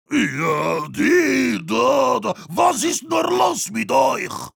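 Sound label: singing, German; Heavy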